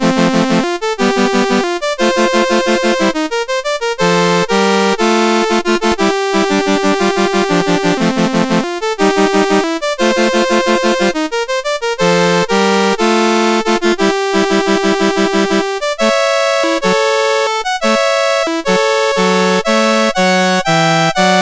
sid CSG 8580R5

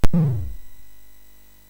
SFX, MOS6581; Narco Police; hvsc

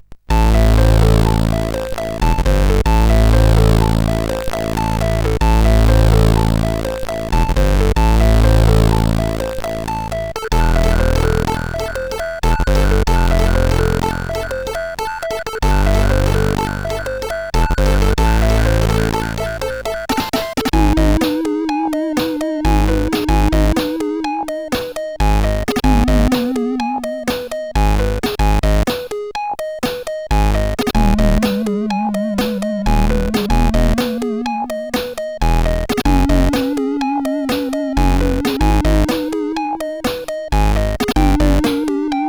sid CSG8580R5; Accept or Die

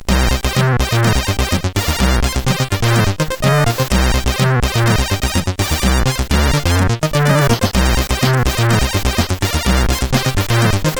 funk level, CSG8580; CSG8580R5; Orcus; hvsc

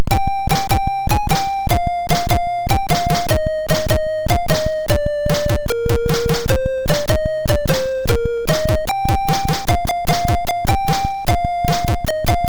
Oh Flute, MOS6581R2

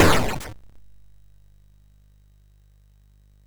Power of Black Bandit, MOS6581R2

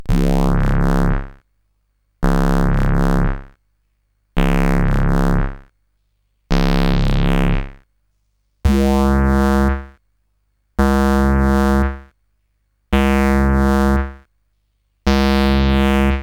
Synth Samples Bass, CSG8580R5 sid

